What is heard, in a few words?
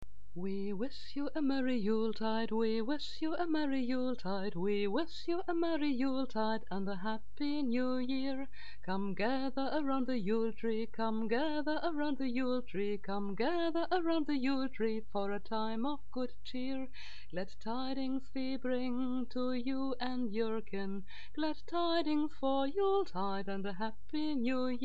we wish you a merry yuletide acapella